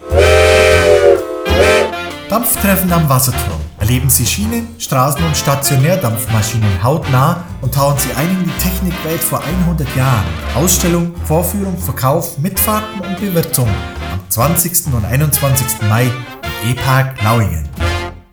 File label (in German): Dampftreffen; Dampftreffen 2023; E-Park